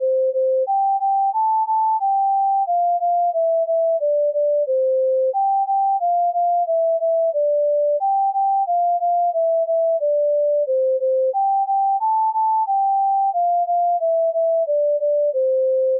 twinkle melody

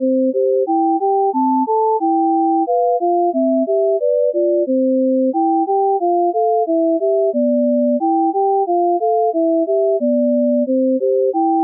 twinkle

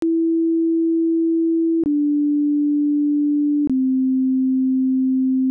cde reversed

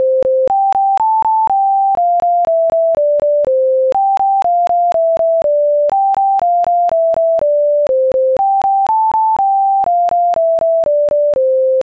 twinkle melody

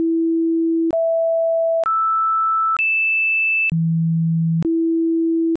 octaves

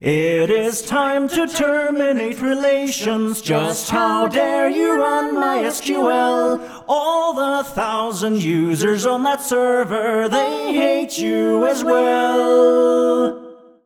harmony

